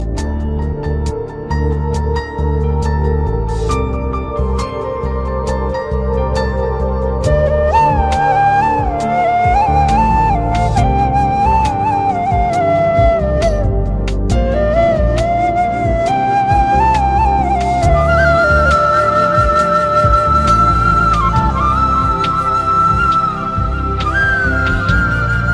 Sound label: Soft Soothing Flute